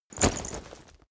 leather metal impact chink

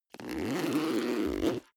zipper backpack open

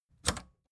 record player power click on fast

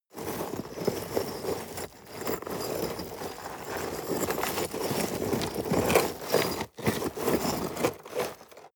scrape rocks long

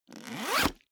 zipper backpack open accelerate

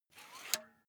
air pump small out

